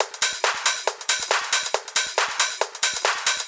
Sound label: Outlaw Kit 138BPM Loop, Drums & Perc; Gminor